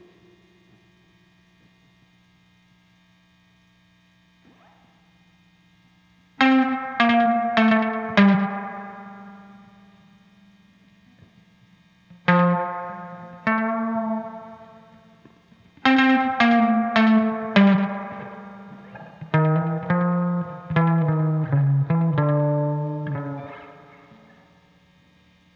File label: guitar overdub; guitar overdub gareth